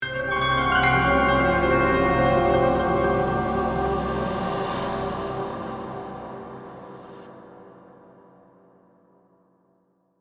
Piano Ghostly, Horror, Musical, Accent, Haunting, Eerie; Scary Soundscape; BlastWave